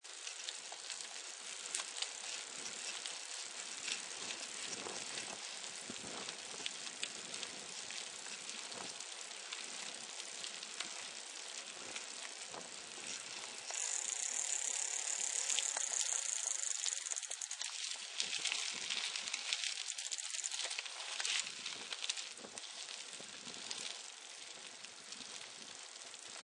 Bicycling On Pavement Or Road, Bicycle: Cycling on pavement or road; Bicycle: Cycling on pavement or road. Cycle gears and surface sounds.; Bicycles